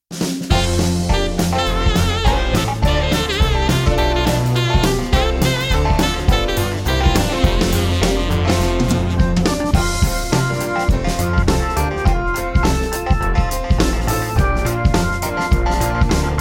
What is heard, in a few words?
Instrumental